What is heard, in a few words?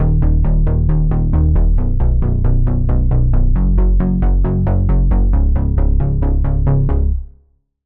Bass, Sylenth1; Routed Sound; Sylenth1 Expansion - Reality Maker Vol.1